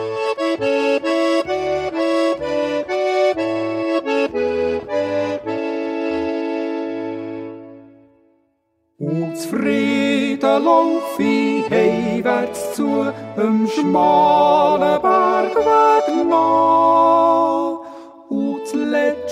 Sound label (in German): Duett